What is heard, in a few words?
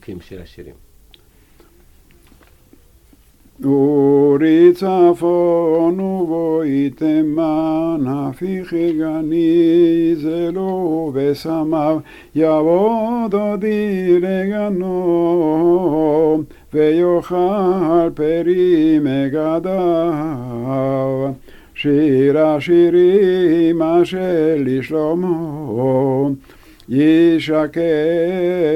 Shir Hashirim; Haggadah